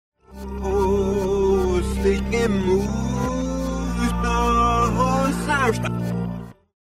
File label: reverse, hard rock; backmasking contrario